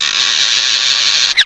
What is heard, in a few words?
Creaking Noise; PC; MARIOTEACHESTYPING2